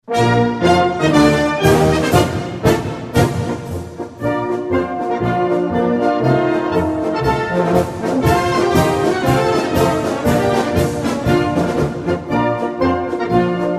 regimentsmarsch

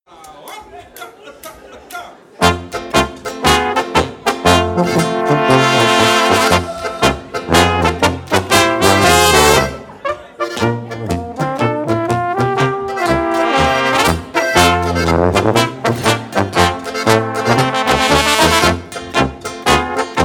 polka

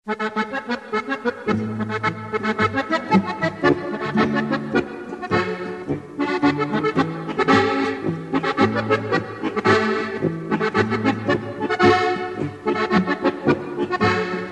marsch

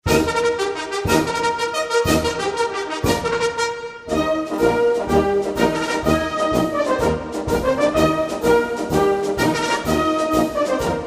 militaermusik

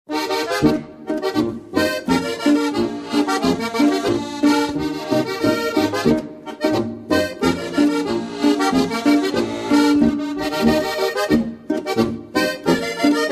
boarischer

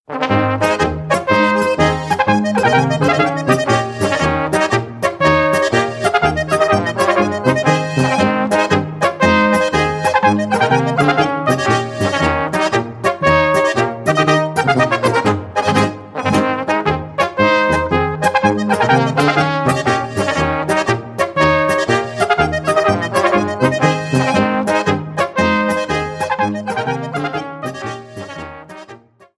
polka

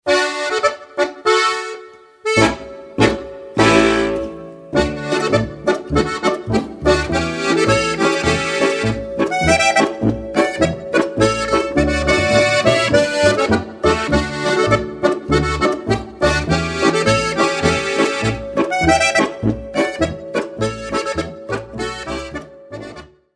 marsch

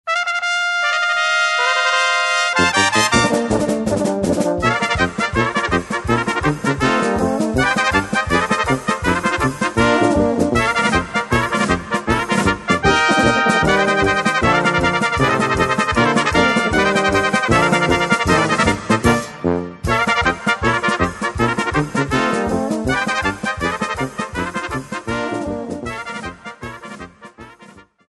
lechnertrompeten

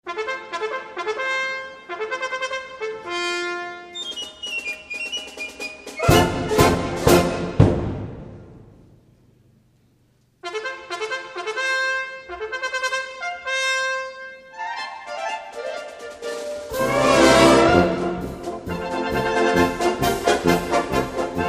marsch

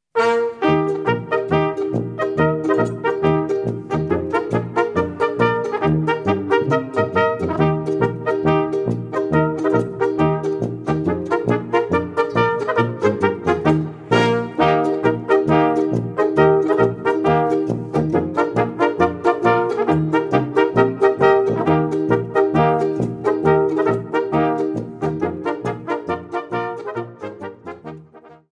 polka

